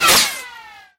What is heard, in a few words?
bullet passby; scripted sequences, a1, safehouse